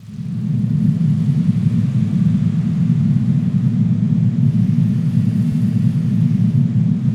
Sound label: RUMBLE RAIN; Spectrasonic Distorted Reality 1